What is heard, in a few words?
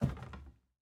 Footstep Lab